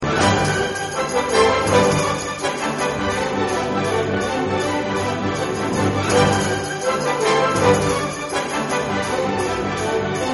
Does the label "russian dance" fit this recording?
Yes